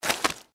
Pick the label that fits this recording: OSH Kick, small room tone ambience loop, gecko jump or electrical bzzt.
gecko jump